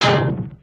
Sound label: Rocket Boing; cinematics